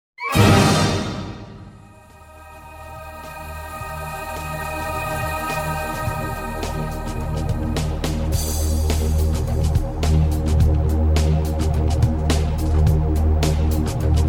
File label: mission success; timesplitters 2